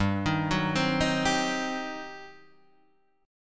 G Major 7th Suspended 4th Sharp 5th, G, down, Guitar, Standard; GM7sus4#5 arpeggiated